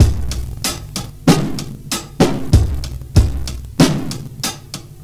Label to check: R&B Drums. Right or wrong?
Right